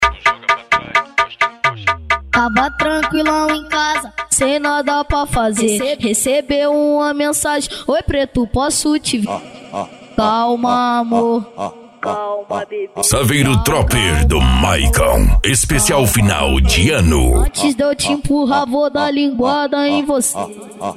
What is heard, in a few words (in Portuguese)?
funk agressivo funk